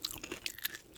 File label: Bike Oil